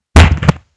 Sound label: heavy objects falling